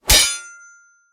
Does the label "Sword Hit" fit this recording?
Yes